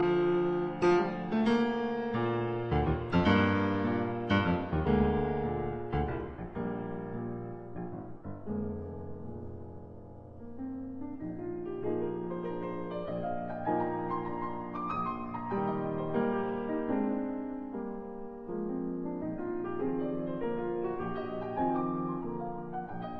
Piano Sonata in F sharp minor, Finale Allegro un poco maestoso; Piano Sonata No 1; Op 11